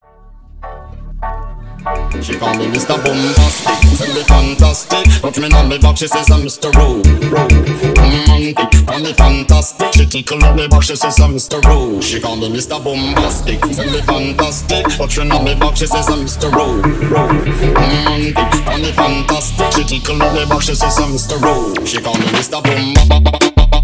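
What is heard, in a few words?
Moombahton Intro Splice 98 BPM; Moombahtom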